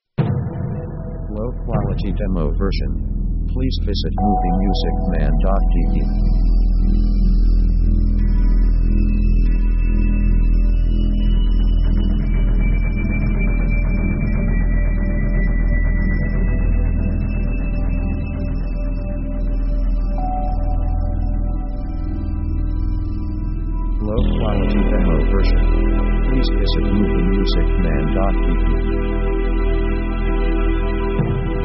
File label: Atmosphere; synth themes